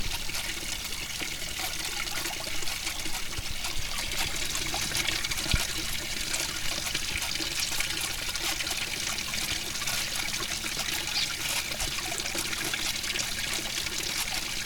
Water

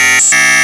Confused Robot

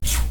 New lazer

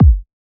Subby Kick Drum

